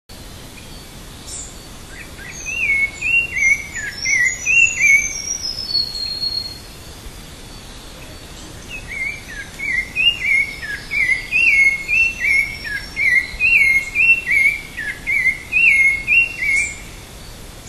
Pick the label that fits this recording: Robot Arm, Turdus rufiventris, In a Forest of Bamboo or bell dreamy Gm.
Turdus rufiventris